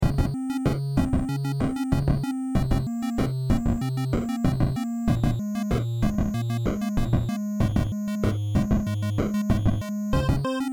Short 8-bit Music, A short 8-bit music that can be used as menu music or in a trailer for your project